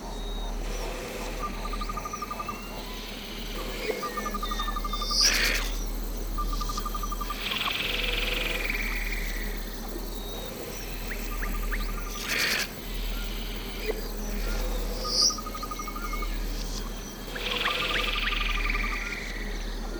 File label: insect env; Karutan